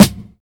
Dry Snare One Shot C# Key, Royality free acoustic snare tuned to the C# note